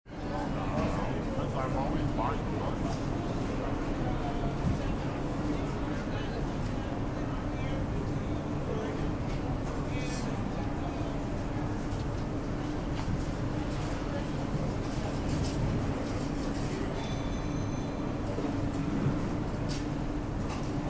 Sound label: Chilean market interior with large activity